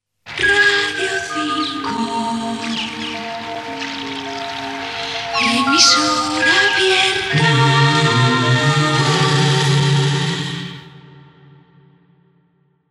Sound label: jingle; RADIO 5; emisora abierta; 1983